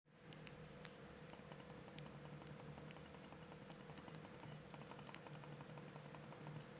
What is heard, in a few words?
Silence Buttons